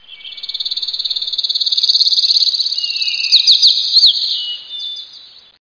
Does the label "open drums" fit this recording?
No